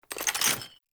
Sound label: Reload Mag Raise; weapons; Scaldra AK